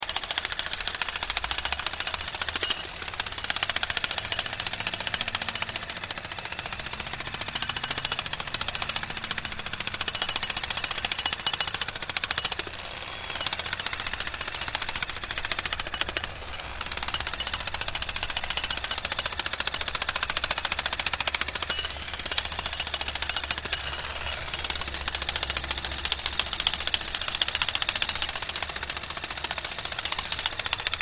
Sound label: Construction, Construction Ambience Jack Hammer Loop; Industry